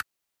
Unpause; Frogger; SNES

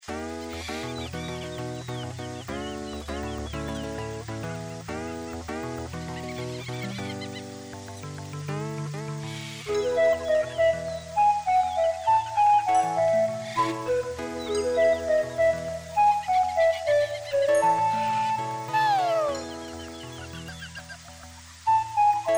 BGM Forest; Adventure of Heroes; Android

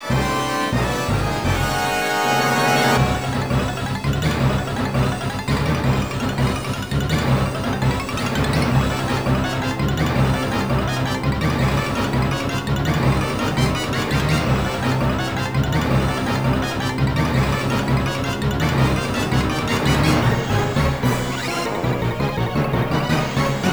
Battle, Normal Boss BGM; Gaiapolis; Mystic Warriors; arcade

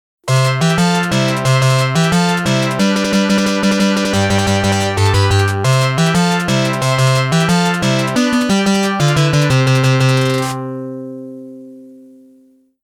Clear BGM; Gun Smoke

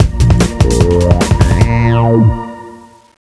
Win

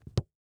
FOOTSTEP Leather Wood Hollow Jog; mono; Don't Be Afraid The First Toy

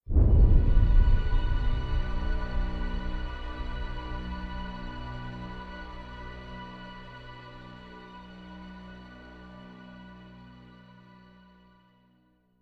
Stinger Uncertainty Short; Pillars of Eternity

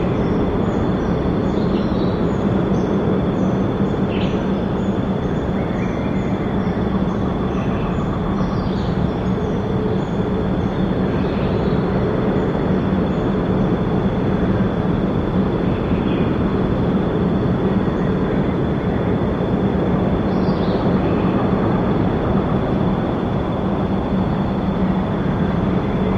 Wind forest

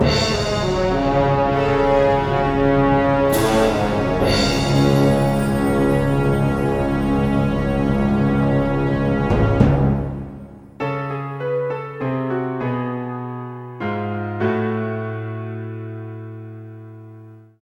Miss; Digital Glider Airman; PSX

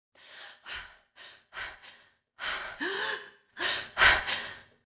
Crash Gasp

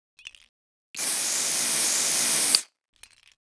Pipe Fixative

